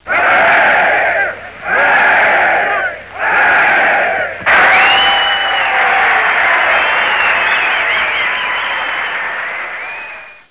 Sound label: crowd